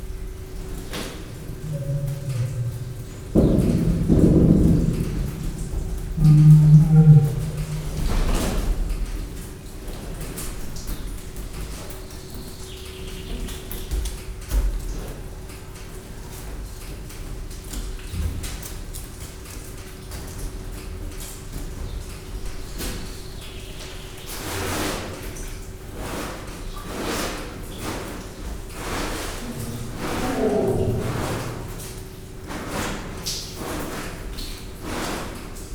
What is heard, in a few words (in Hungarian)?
pisil, oroszlán; pecsi zoo 2017